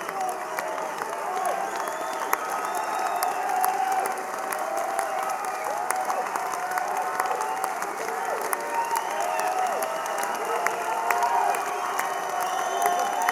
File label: Applause; Ancienne Belgique, Brussels, Belgium